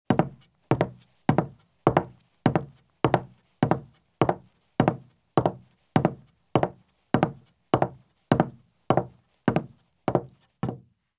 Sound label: Heavy Boots Walk at Very Fast Pace on Wood Porch or Footbridge; Sound Ideas; Footstep Sound Effects